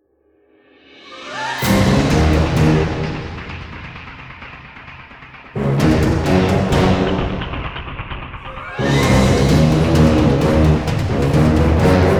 Music Danger Alert No Clusters; starcraft 2 wings of liberty; MissionMusic

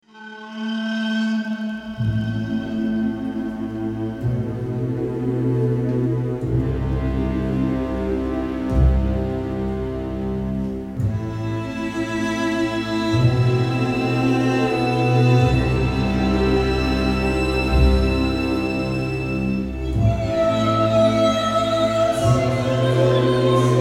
Space Witch Chorus; ratchet clank into the nexus

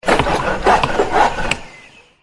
City Wood

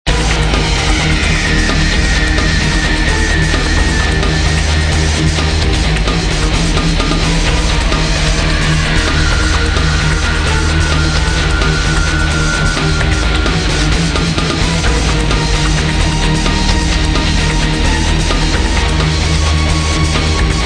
cave - suspense

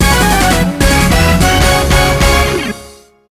Jingle ~ Victory Pose; mario super sluggers; wii

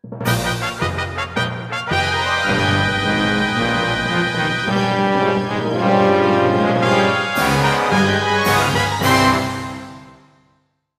Jingle Game Over; A-Train City Simulator; A-Ressha de Ikou 3D; 3DS

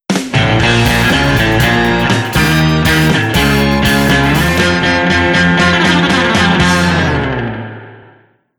Sting Win (Bb)

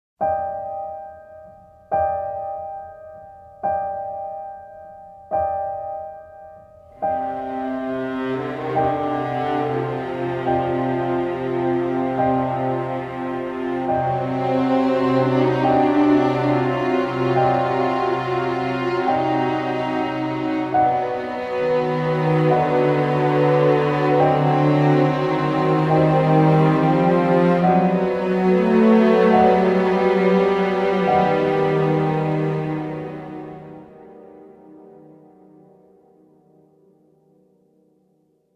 Field BGM; Xenoblade Chronicles 3

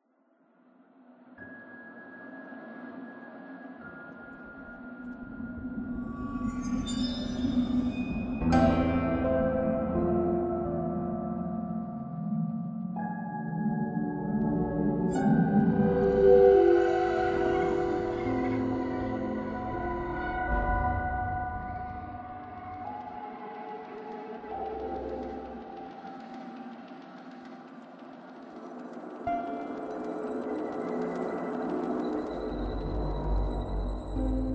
Shoppy Music

